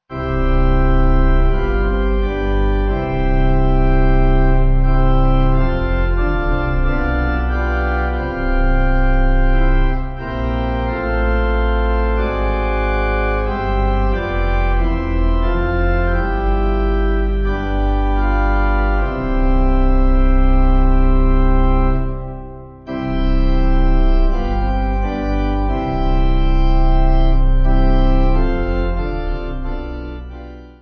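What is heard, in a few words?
Pipe Organ; Peace Perfect; Pax Tecum 10.10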